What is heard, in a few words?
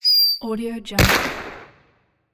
petard